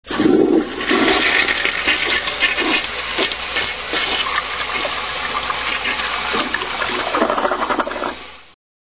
Toilet

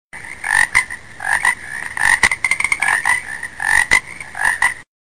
Frog

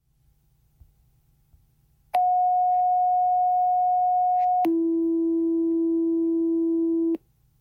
Fire Tone

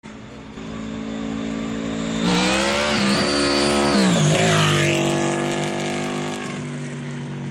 Blown Vmax Flyby

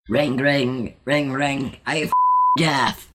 Ring Ring C

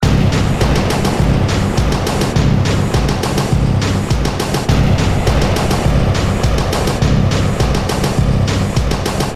Gun Shots Musical

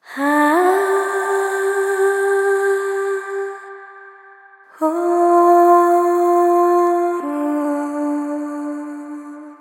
Vocal Swell

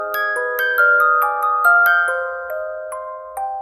Music Box

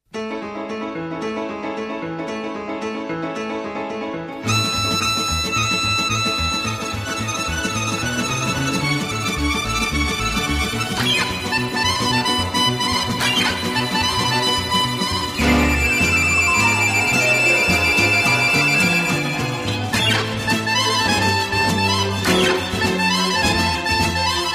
Harmonika

